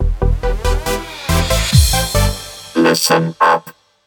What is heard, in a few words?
announce jingle